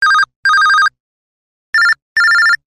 Simple Beep; LG; A200

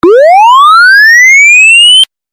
melody SWEEP; LG Wing 5G Verizon

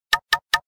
Knock; Optimus L3 II E430 Movistar

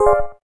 gayageum sharp; SKY IM-A850S-VEGA-R3

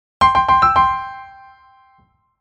jingle; ZTE; ZMAX-Z970

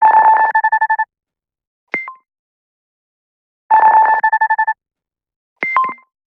wings, ringtones; Nothing Phone (2)

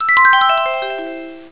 Call Back, Cisco Unified IP Phone 7945G

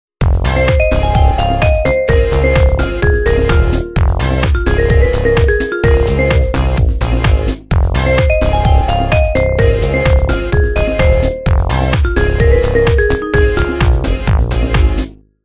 Beach house, ringtones

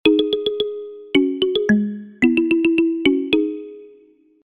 Percuss, alarms; ACE Clever-1

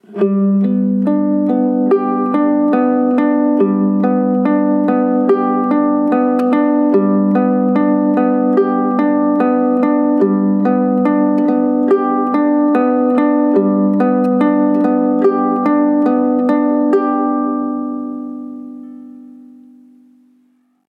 alarm guitar; Mudita Pure

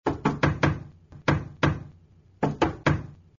knock knock; Amoi Skypephone WP-S1

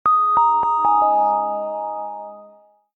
Power Off; Samsung Galaxy S SHW-M110S; New SKT

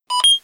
Shutter, Samsung phone; SGH-T369 Linx

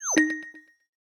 Exclamation Mark; LG; V30 Plus; alarms

